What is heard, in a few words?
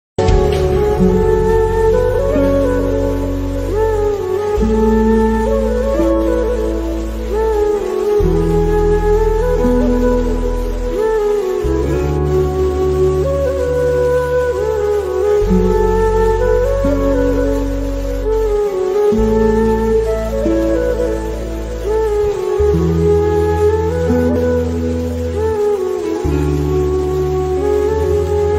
instrumental sad ringtone